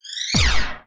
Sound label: boo proton glove